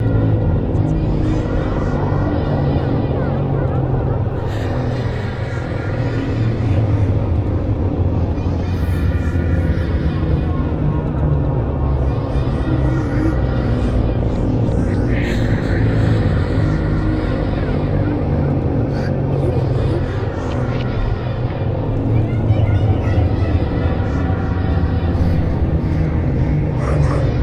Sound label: Scare Overworld Well Decent Loop; whispering willows